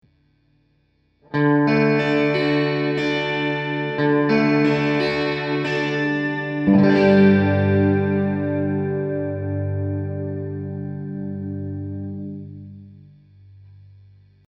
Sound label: TC1210 Xflanger